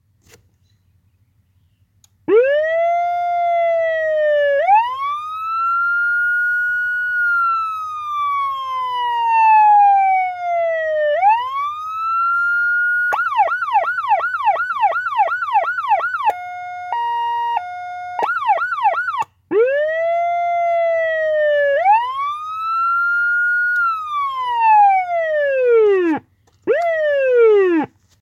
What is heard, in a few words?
Federal Signal PA200